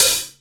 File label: thin open hat A# key